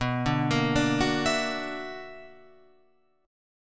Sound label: B-Minor Major 11th, Guitar Open F; BmM11; arpeggiated; JGuitar